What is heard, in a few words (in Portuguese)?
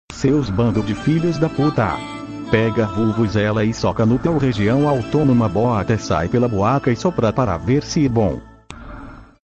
vuvuzela maldita